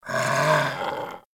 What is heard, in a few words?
Kubrow Puppy Sleep Idle Snore; Warframe